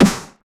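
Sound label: tight drill snare one shot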